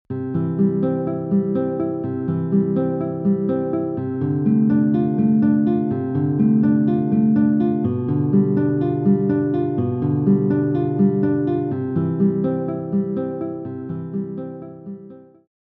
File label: Prelude in C Bach, guitar; classical guitar